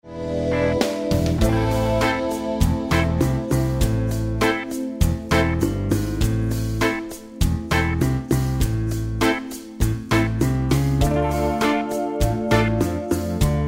Latin Groove Improvise With A Harmonic Minor Scale